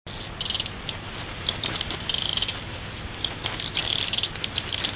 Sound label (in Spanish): picaflor chico canto